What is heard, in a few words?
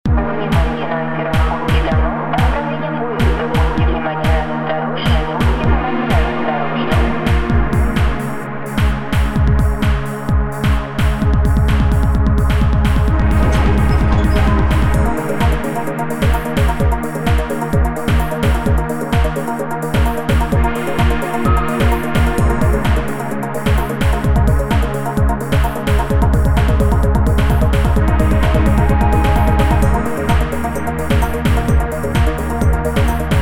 Electronic Punk